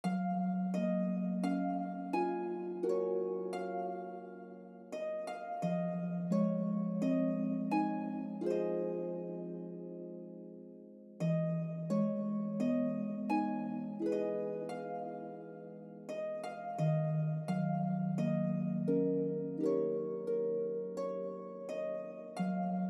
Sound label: Good Night No Strings; fragile dreams farewell ruins of the moon